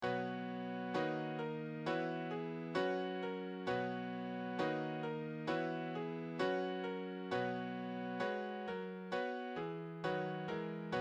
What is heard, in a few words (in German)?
Klavier